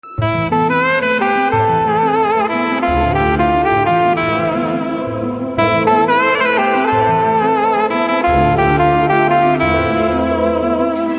BGM Theme; Ravoyi Chandamama

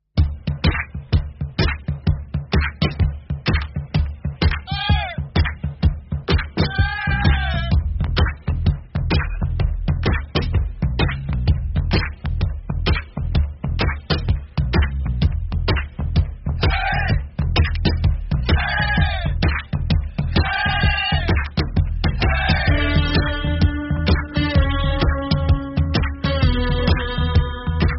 Dance BGM Instrumental Ringtone; Joker Stairs Dance

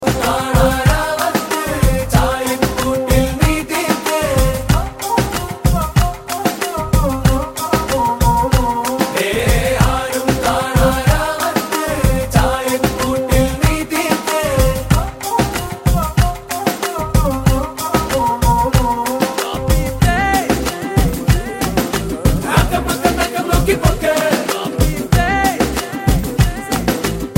Kannada Ringtone

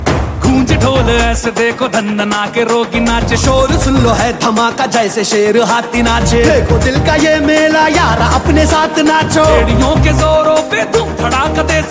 Dhol

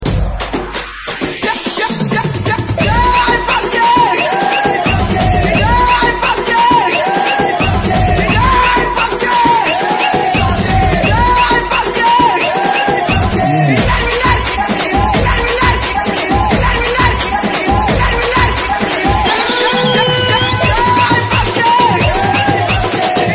Song Tone; PUBG

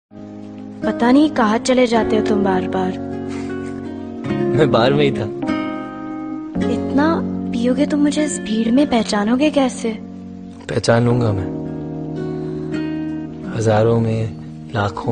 heart touching tone; aashiqui 2

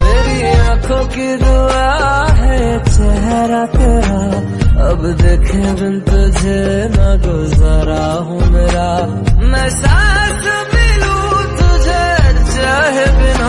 Famous Hindi Song Ringtone

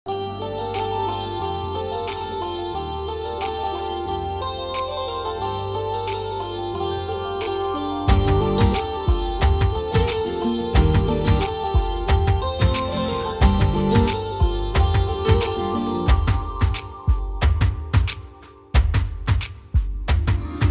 Instrumental Music Ringtone

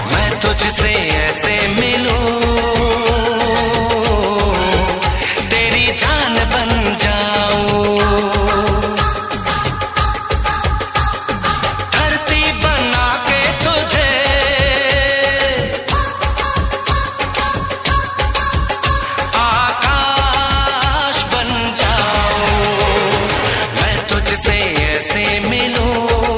Ringtone Male Version

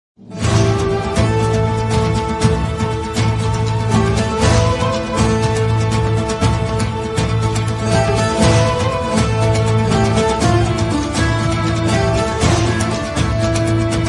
Ringtone; Kurulus Osman

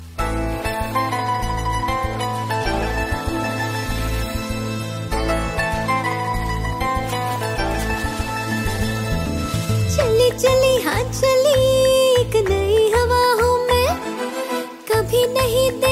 Song Instrumental Tone

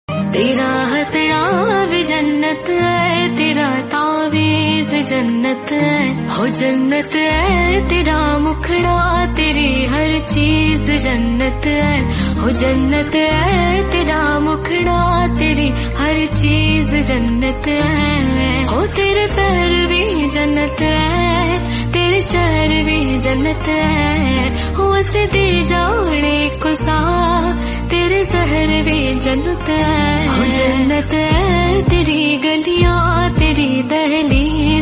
female

